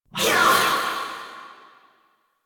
Unyielding Gallop Cast Vocal; Warframe; Dagath

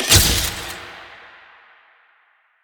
Camera Flash; Warframe; Nineteen Ninety Nine; Quests; Romance NYE

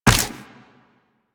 Run Ice, Footsteps; Warframe; Tenno

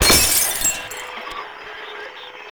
Destroy Television; Warframe; Ambience; Nineteen Ninety Nine

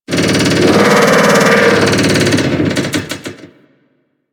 Thumper Bot Spawn Groan C; Warframe; Grineer

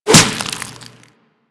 Infested Whip Impact Default

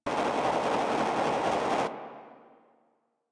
Hub Kneeling Station Swirl; Warframe; Ambience; Tenno

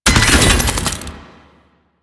Ammo Station Activate; Warframe; Veilbreaker; Grineer Forest Thumper; Kahl

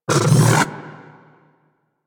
Arch Gun Alt Charge Up; Weapons; Warframe; Archwing; Hildryn

